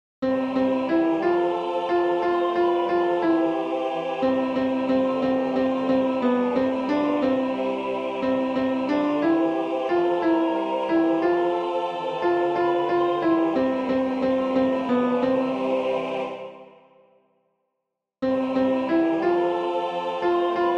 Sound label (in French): Hymne au St. Laurent Refrains Alto; Balade à Saint-Vallier